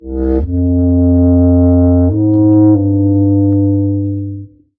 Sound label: sax low tones; Loops; Sad